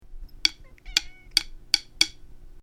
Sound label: rhythm, noise